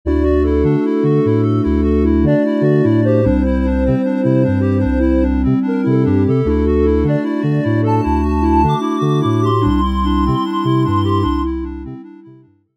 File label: music sketch